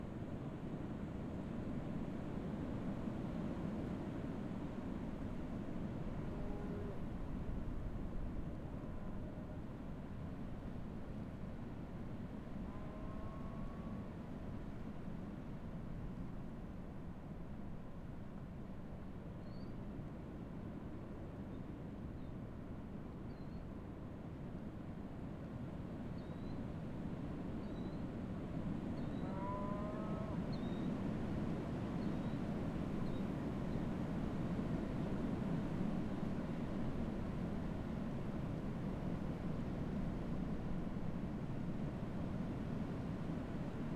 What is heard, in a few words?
Wind heavy slow headwind distant birds cows; Wav Junction Sound Effects